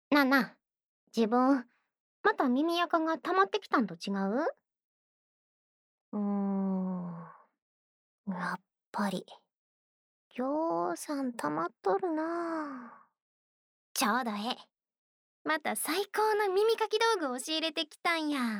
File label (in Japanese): ちょうどええ、また最高の耳かき道具を仕入れてきたんや（綿棒耳かき左）, ASMR; 宅飲みASMR; 女友達の家でだらだら ～202号室関西弁の同級生～